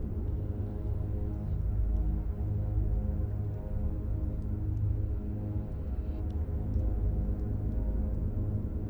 free Spin Idle Loop; netent

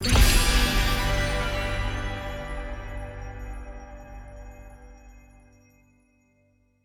medium Win; netent

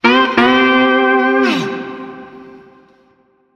small win riff; netent

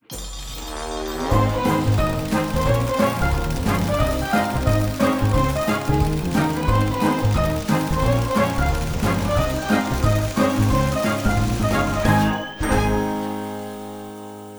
mega win; netent

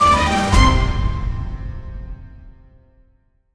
medium Win; netent

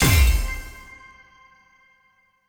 break open; netent

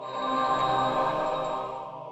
reel Spin; netent

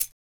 btn out; netent

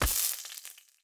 reel bounce; netent

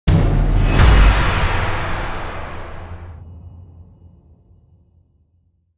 Hot Spot Land; netent

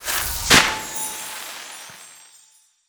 small win; netent

